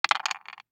DICE on Wooden Tray, Throw and Roll, Standard, 2 Two Dice; Samples; ArticulatedSounds; DICE Sfx Party (Extended)